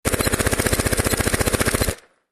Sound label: Silenced Machine Gun